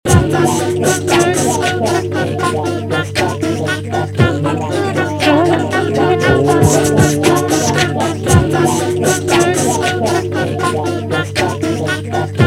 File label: jazzz